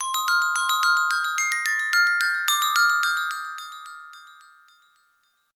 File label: Glockenspiel; Tecno; Pop 9 4G